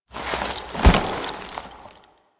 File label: Horse Foley Mount; Foley Actions; Warframe; Vehicles; Duviri